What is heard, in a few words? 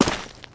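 player gravel, footsteps; csgom; cstrike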